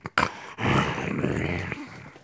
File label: Zombie; Blind Survival